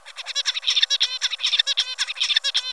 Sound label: father song